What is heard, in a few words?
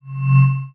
GUI Swipe